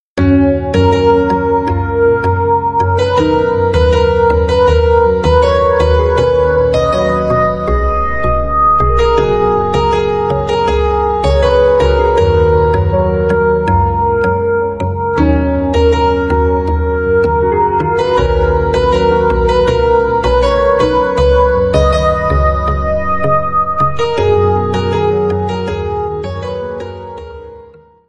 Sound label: Instrumental Love